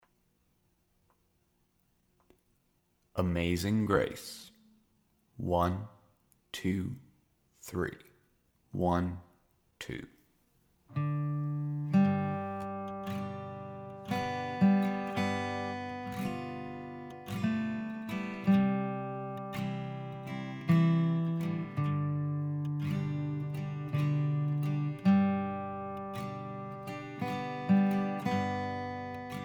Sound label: Melody 55BPM; Amazing Grace; Mel Bay